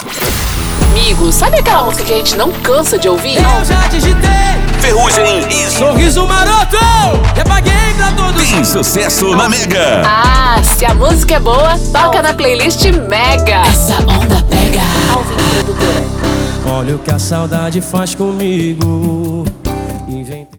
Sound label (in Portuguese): INTRO MUSIC; MEGA FM